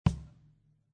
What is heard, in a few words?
Kick; Loopology; Get Dirty